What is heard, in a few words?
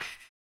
HAMBONE, Vocal Blues Soul; Spectrasonics; Planet